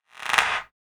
CLAP FX

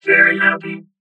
Very happy

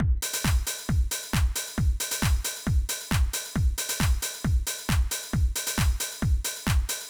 Dance kit 135 bpm

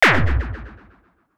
Superkick Fx

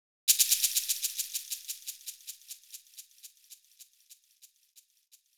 shaker FX

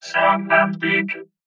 Sun and beach

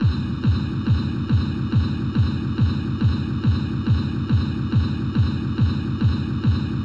Basic 140 bpm

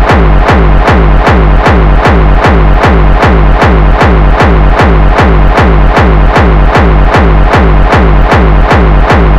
HARDCORE DRUM LOOP

